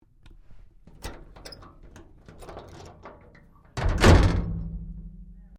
DOOR INTERNAL CLOSE, Sounds onboard HMAS Castlemaine; Boat Sound Effects; Boats (Sound Effects:Vehicles)